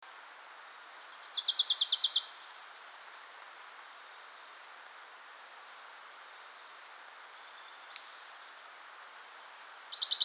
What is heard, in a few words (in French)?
pouillot de Bonelli